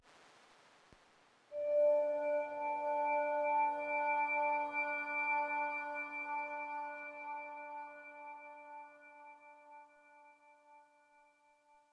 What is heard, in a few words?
Sci Fi Synth Sfx Alien Artifact Emitting Shiny Sound